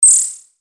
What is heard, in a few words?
lazer